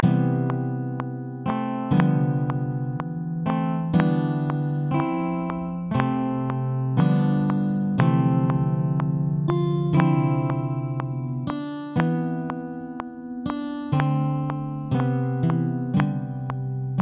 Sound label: sick beats